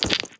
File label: gem up; gems